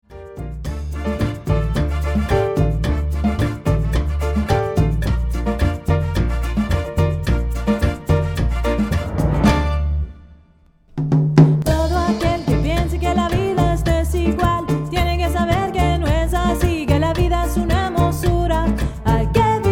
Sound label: Latin Singer; Los Angeles Latin Singer; Los Angeles Latin Singer and her world-class backing musicians